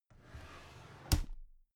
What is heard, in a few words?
drawer cabinet carton open regular